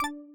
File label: round count down beep major